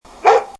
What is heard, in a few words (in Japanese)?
wan